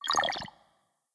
UI Scribble Low; blue archive

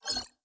Loading; blue archive

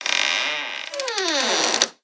Door Slow Open; blue archive

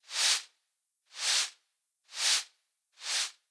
Broom Sweep; blue archive

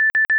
error, ui

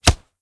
Close; blue archive

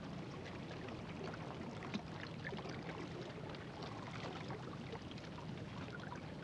BG Boat; blue archive

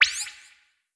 Pad Open; blue archive

